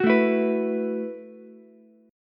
F sharp Minor 11th, Ukulele, C6; strummed; JGuitar

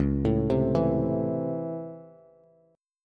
C sharp Minor Major 7th Sharp 5th C sharp Bass

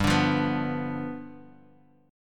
G 7th G, Guitar Open D; 5,5,3,5,x,x; down

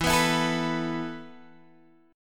E Suspended 4th E, down; strummed; Esus4

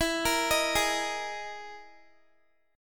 E Major 7th Flat 5th, Guitar; EM7b5 arpeggiated; x,19,22,20,20,x; JGuitar

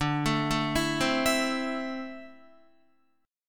D 7th Suspended 2nd, down Guitar; D7sus2 arpeggiated

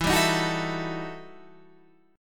E Minor Major 11th E Guitar Standard; EmM11 strummed

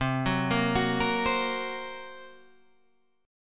Bb Suspended 2nd Sharp 5th C

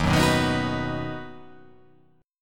D sharp Minor Sharp 5th D sharp down

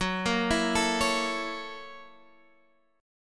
F sharp 7th Sharp 9th, Guitar, Standard; arpeggiated; JGuitar

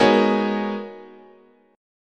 G sharp Minor 11th, chord, guitar

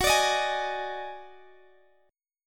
Eb Minor Major 7th Double Flat 5th Gb

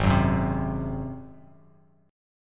G sharp 7th G sharp, Bass Standard; G#7; strummed; JGuitar

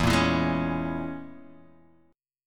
E 6th Flat 5th Guitar Half Step Down

